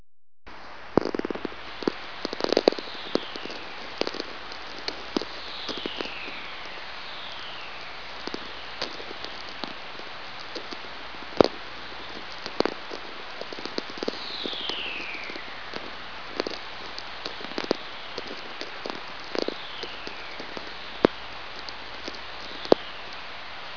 VLF whistlers t-storms, Nevada; mcgreevy

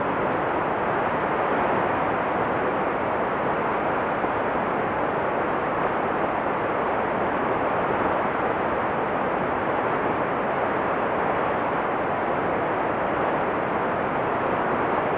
jupiter io b storm 23 22mhz

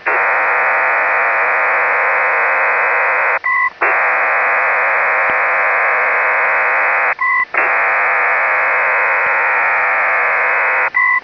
mode Pactor 3

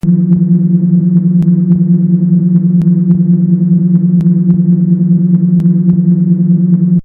star light curve kepler; star kic

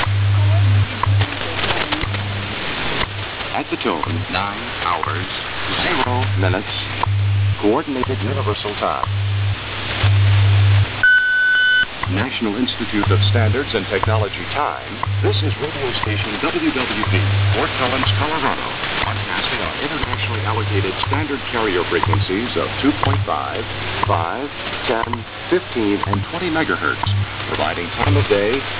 wwv nist 5mhz; 0900gmt

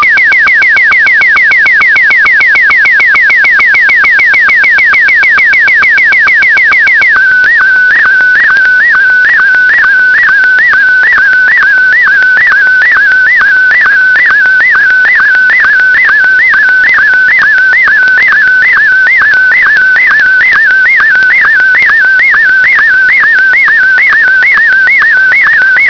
mode sstv martin1

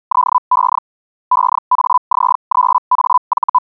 hellschreiber

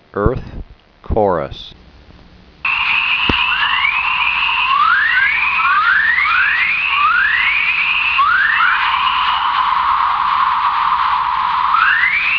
geomagnet chorus; uiowa

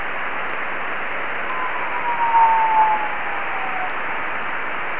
radarecho endeavour sts88

